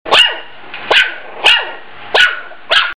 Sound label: puppy bark ringtone